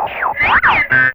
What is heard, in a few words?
Wooo, Fairlight CMI IIe Effects